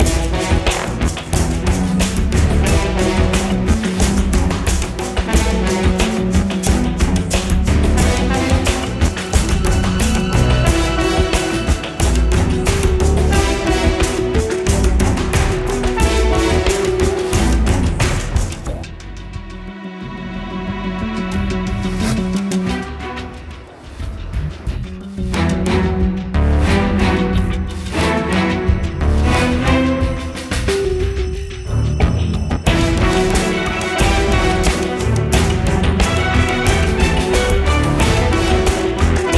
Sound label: Reactor Orch; Crysis